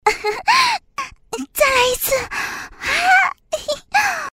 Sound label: moan; Imperial Girls